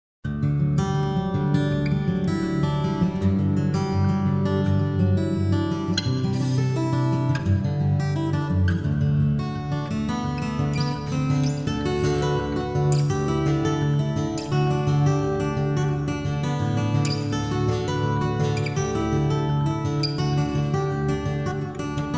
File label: Acoustic Guitar Random Hall